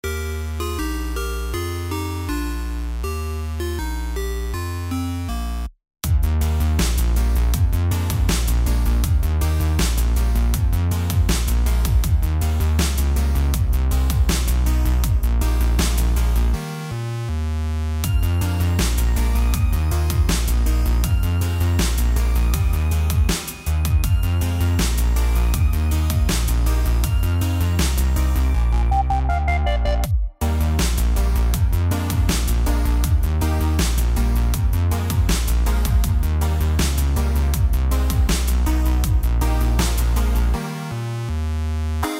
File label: instrumental